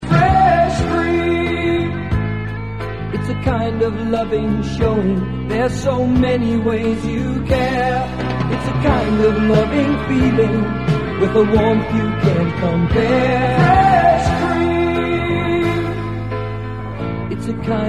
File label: TV Ad; Fresh Cream